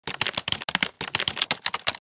Mechanical keyboard typing

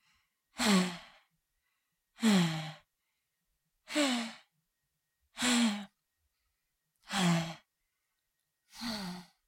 Cartoon little child voice breathing

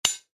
Battle melee accent hit

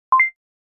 HUD futuristic button selection

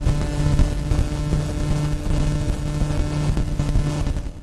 Static interference

